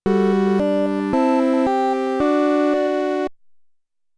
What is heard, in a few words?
Fanfare; rockfish-2011